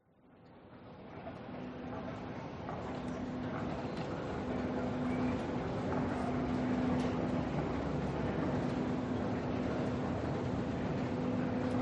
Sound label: train station subway ambience quiet voices close and distant no trains nyc broadway